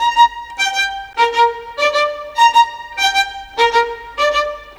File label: Violin, Cinematic; Sonic Foundry (Sony Creative Software); Orchestral Series VOL-3 - Cinematic